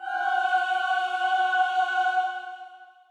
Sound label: choir